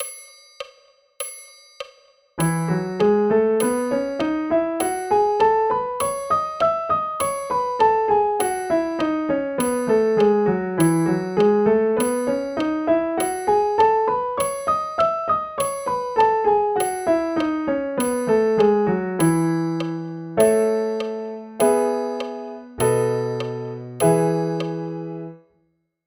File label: E Major Scale and arpeggio; bastien